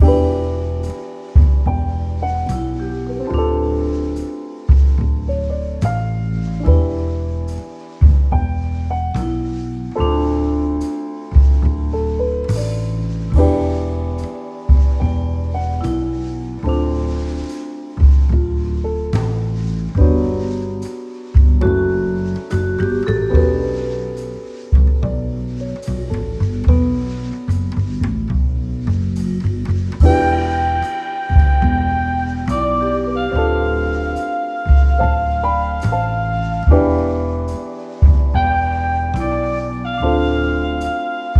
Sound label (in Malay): Musik Latar Belakang